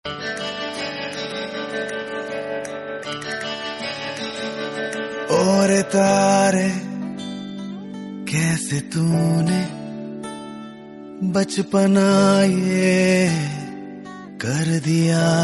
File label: hindi love song